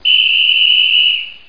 whistle

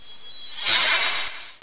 BACK SWORD

